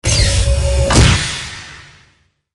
Space Door Sci Fi Space Ship Alert

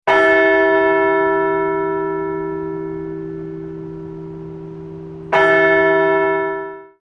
Church Bell Alarm

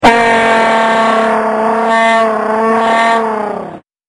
Vuvuzela

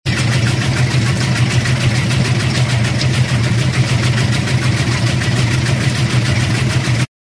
house washing machine

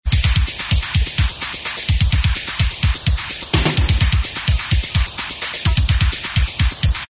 accents steamy groove

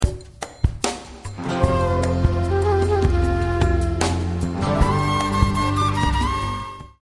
graceful and exotic

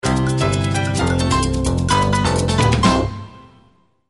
Island Alert Caribbean Tone

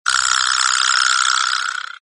vibravibe

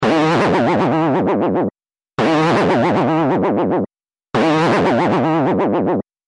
Comedy Boing

